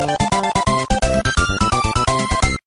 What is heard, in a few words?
Victory Dance; Kirby; top 40 video game tones; android